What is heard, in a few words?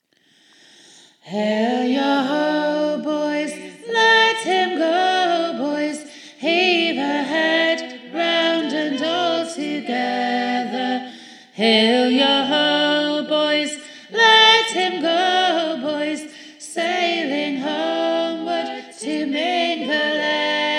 alto; mingulay